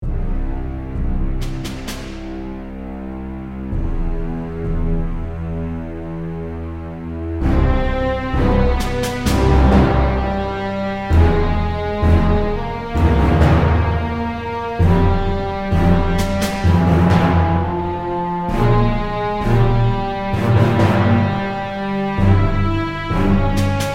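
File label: Battle Ambience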